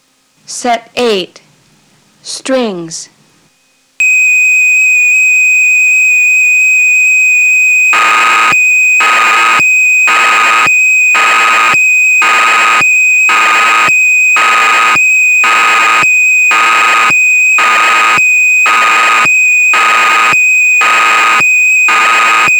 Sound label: Strings, dx9